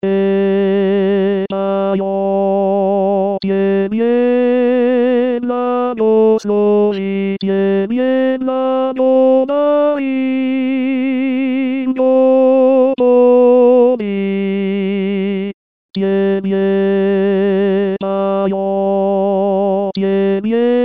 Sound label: tebe poem tenor